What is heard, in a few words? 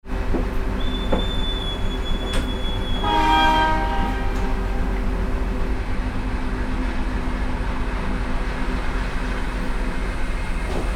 train leaving slowly